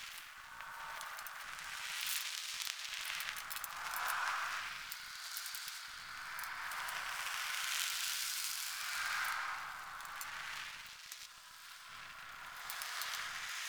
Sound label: Fire Blade Active Loop; Weapons; Enhancements; Elemental